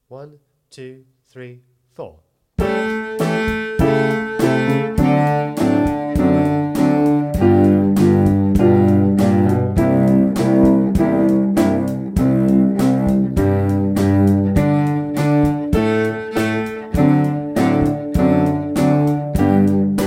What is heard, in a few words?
down up cello